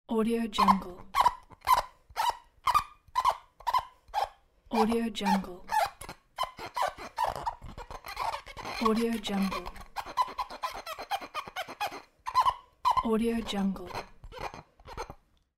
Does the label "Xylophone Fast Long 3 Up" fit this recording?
No